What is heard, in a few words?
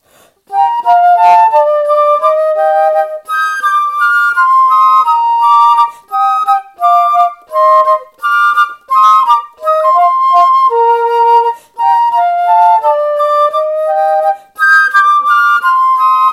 duet bourree telemann; duets for all